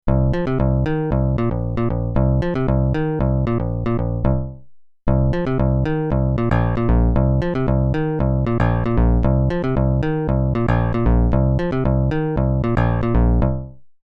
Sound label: 360 Systems Midi Bass Pro Zones